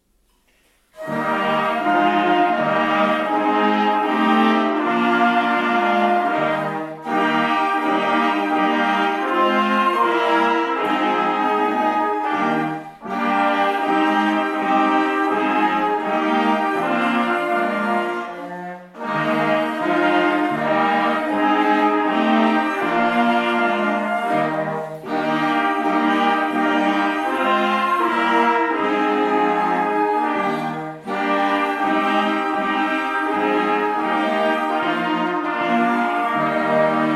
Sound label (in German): Bläserklassenorchester, Der Mond ist aufgegangen; Digitales Bläserklassenorchester der DST